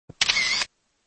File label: shutter; chess; horse; 贝密游戏